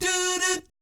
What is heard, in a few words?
DOO DOOT, Jazz